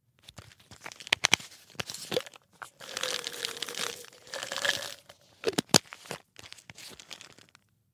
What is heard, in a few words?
Pill bottle